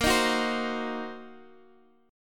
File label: Gb 6th Flat 5th Bb, guitar